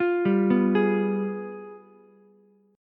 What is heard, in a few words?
G 7th Flat 9th, Ukulele; arpeggiated; JGuitar